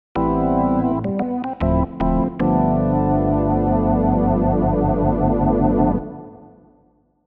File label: Jupiter 8 chorus organ; opx pro3